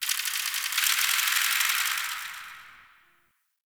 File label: High Mechanics; Guild; Warframe; Misc Remaster